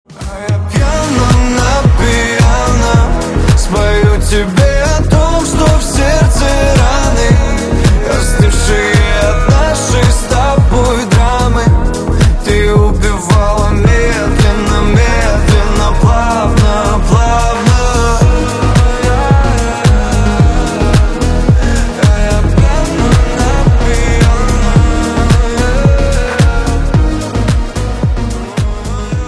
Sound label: piano